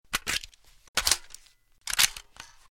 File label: reload empty, weapons sterling; garrysmod